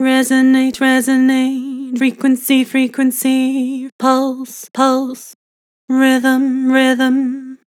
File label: Vibrato Rhythm pulse frequency, Vocals; Harmor Vocals